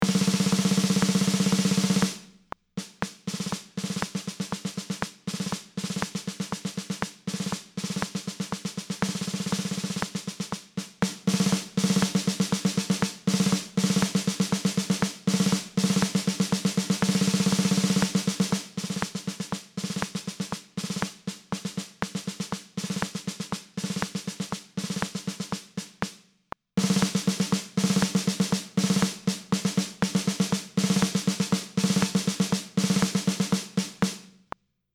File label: Snare Drum